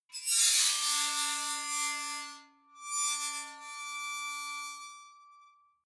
metal squeal bright

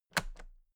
toolbox latches close heavy; industrie wirtschaft; handwerk

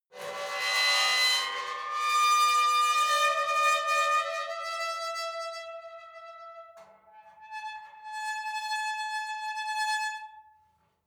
metal squeal saturated long

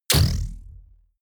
sci-fi electric blast; schusswaffen

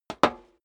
step high heels metal dry single stair down; schritte

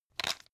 pepper mill fast; soundpool

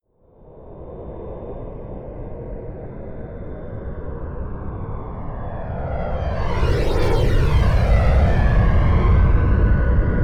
plane boeing 787 flyby left to right close; flugzeuge verkehrsflugzeuge; verkehr transport

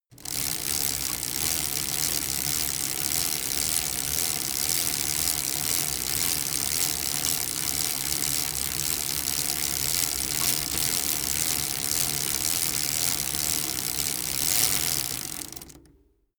bicycle rickety freewheel fast

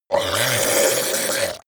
zombie grunt choke male long; kreaturen

